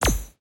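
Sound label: cash stack symbol fall landed; pork knox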